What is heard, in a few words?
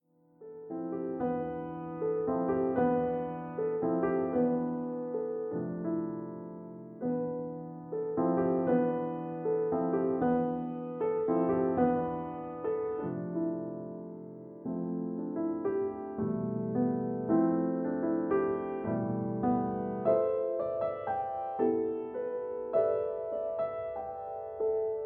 piano sketches